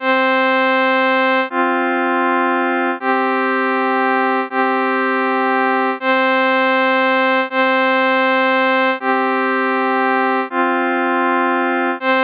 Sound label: consonant